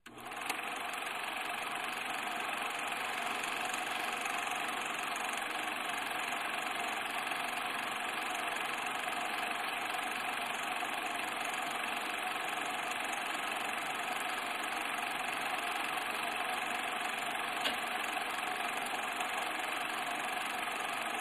Film Projector, 8mm, On Idle Off, W Clicking Sounds; movie & projectors